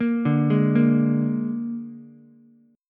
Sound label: Bb 7th Bb down Ukulele; arpeggiated; JGuitar